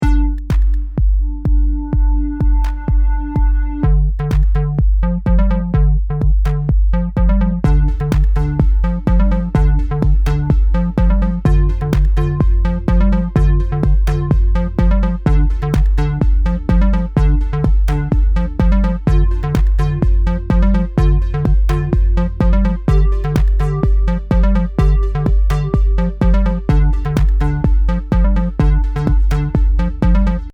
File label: Music Bed